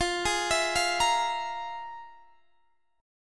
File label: F Minor Major 7th Double Flat 5th, Guitar, Drop D